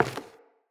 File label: Corrupted Sproutfolk Grunt Step Wood; Enemy; Footsteps